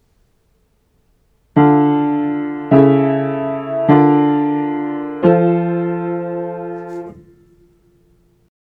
Part Writing First Inversion Triads Aural Training exercise